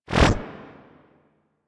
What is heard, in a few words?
Corrupted Notes; Warframe; Bard Quest; Bard Boss Fight